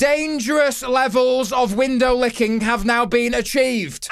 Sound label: window